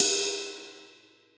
drum ride G key, Royality free ride sound clip tuned to the G note. Loudest frequency: 7884Hz; cymbal drum